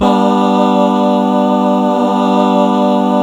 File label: BAAAH G, male choir; Best Service; Hyper Dance